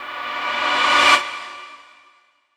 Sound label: DANCE FX; Best Service; XXL Production Effects; Sample CDs